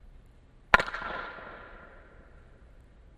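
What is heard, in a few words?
Hockey Medium Impact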